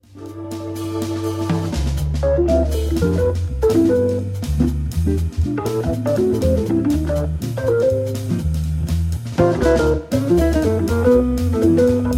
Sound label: trio, organ